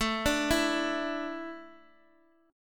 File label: A Suspended 4th A Guitar Open D